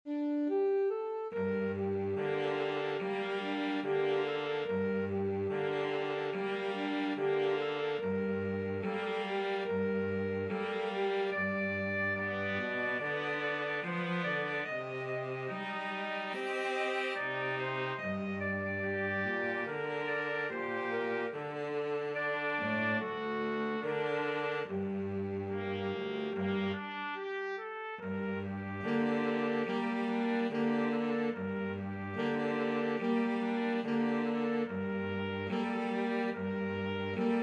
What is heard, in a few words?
bella ciao, flexible mixed ensemble 3 players